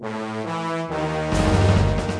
DEATH; xcom

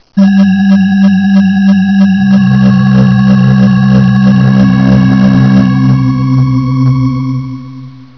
SPACE; Talking E-Mail

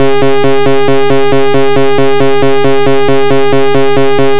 machine

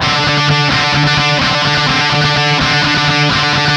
guitar; flash tutorial

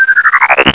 BEAM DOWN; CephaloTron

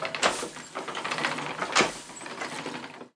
weaver; PHARAOH

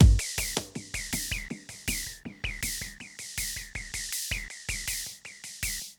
drum loop, ambient, 160; eJay

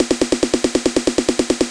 snare

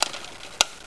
tick

